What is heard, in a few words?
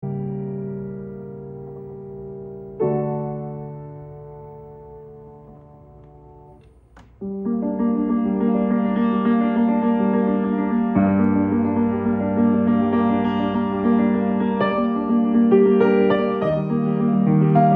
Piano